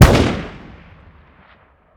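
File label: weapon ar fire player atmo exterior; gmod; viper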